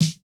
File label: snare, snare house